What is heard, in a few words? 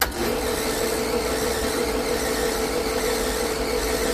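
Postage Meter 6200 Start, Run Idle Loop; Postage Meter Pitney Bowes 6200 Start, Run Idle Loop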